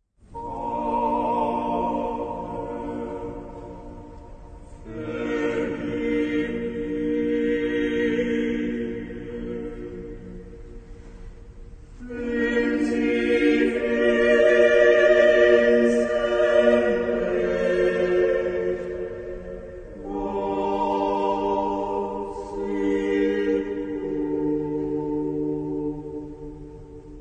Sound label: Choir